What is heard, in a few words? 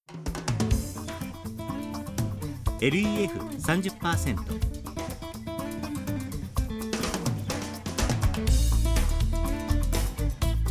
m fusion